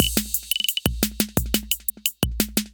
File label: 808 175 Beat, Drum Machines; Junior; Ableton